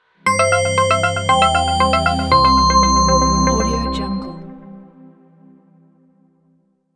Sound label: dream logo